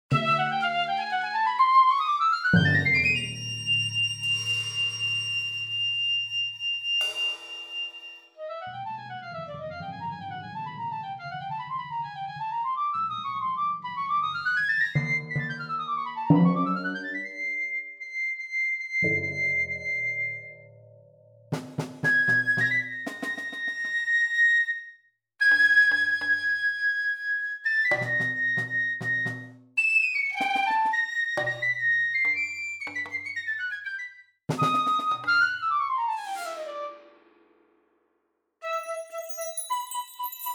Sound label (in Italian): lymantria, flauto percussioni